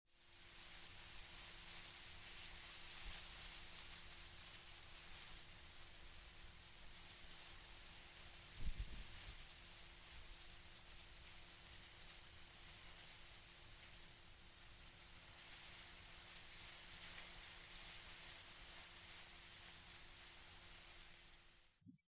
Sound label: wind